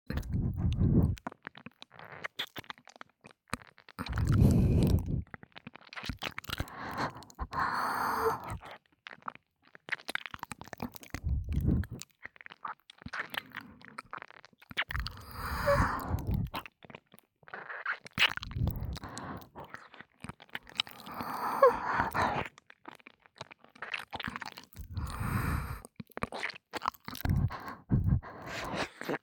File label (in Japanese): (左)吐息たっぷりまったり耳舐めエッチ, ASMR; 黒耳; 効果音無し版